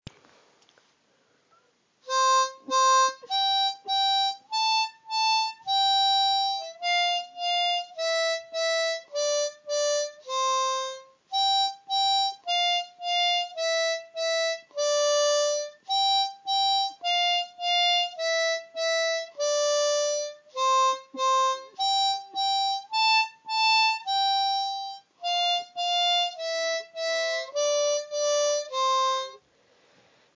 twinkle twinkle little star, ساز دهنی

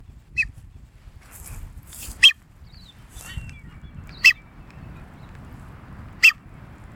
Masked booby

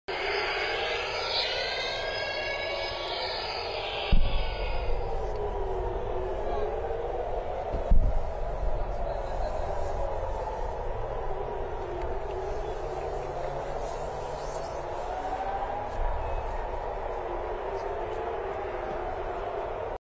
sirens, آژیر خطر جنگ; Iran

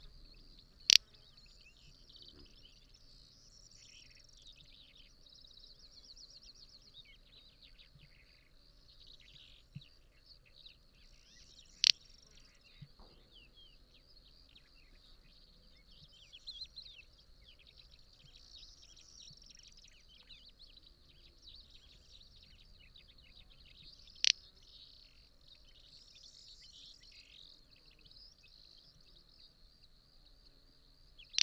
Little-bustard, پرنده زنگوله بال; صدای پرنده زنگوله بال

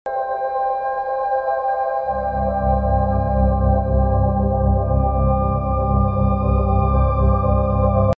Frequency 369 Hz

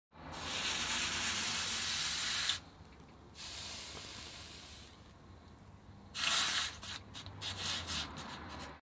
Hot iron in water; آهن داغ در آب

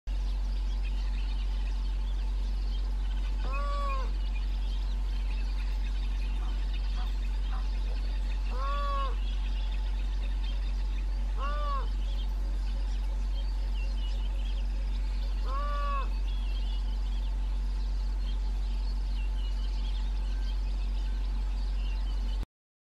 Spoonbill, كفچه نوک

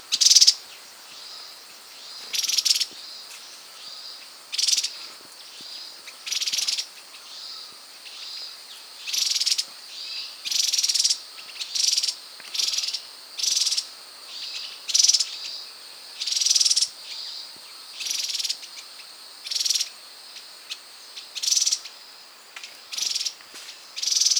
Striated yuhina, پرنده یوهین راه راه

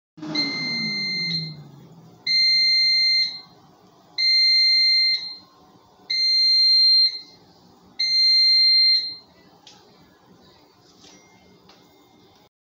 air fryer

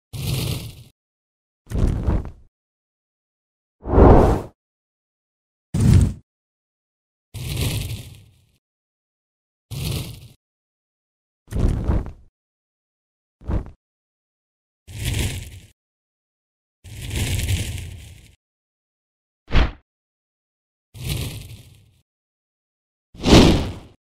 fire movement

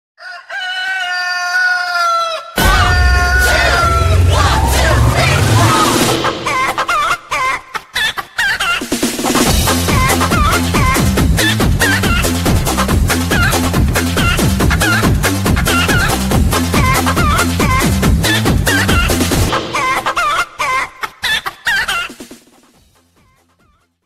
alarm; بیدار شو کنکوری; konkori

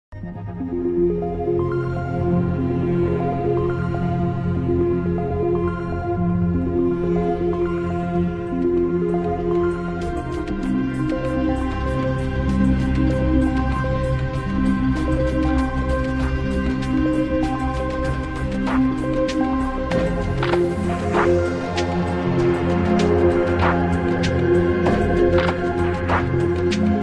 Cosmic music

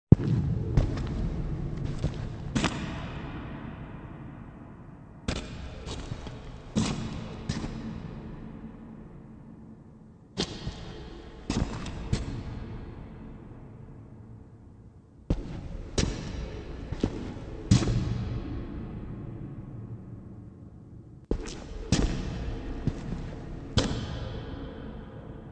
scary walk; پا

